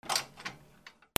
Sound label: BATHROOM